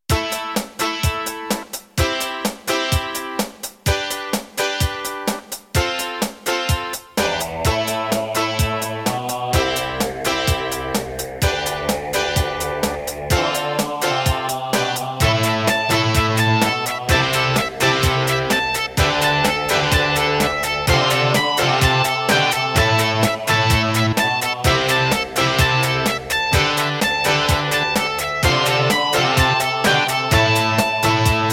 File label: Wave 1, Roland SD-90 GS MODE; Raptor